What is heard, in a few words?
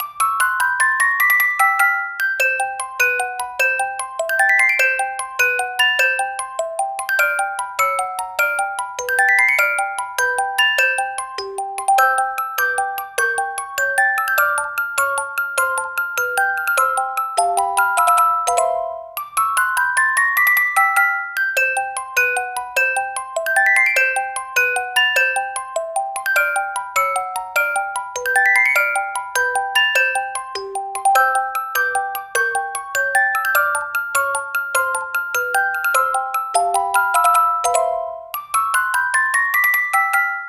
Underwater (Hurry Up!); super mario maker 2; SMB1 Underwater - Moon